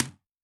Distrt M, Tom, Yamaha DTXpress-II

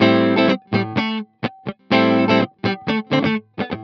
Guitar Funky Loop, 125bpm; Zero-G; Nu Disco

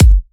Bassdrum

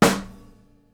SNARE, Live Snares

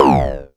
tom; Klone Dual Percussion Synthesiser

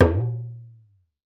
DON DON LO, African Drums; E-MU Formula 4000 Series Vol. 4; Earth Tones

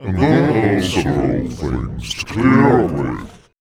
VOICE FX, Dance Vocals

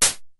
DF-500 HiHat, Drumfire

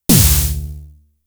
Snare, Drum Machines; Fricke Schlagzwerg

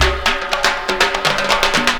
Backward Roll, Drum Rolls; Zero-G Jungle Warfare 1, 2 & 3

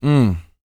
GRUNT, MALE HUMAN; ILIO; Vocal Planet

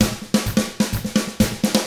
127 Drums

